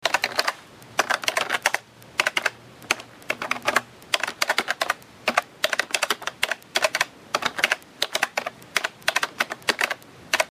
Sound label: Keyboard